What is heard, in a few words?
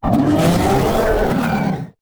taunt, cylarkan, creatures; combat; CosmicRageSounds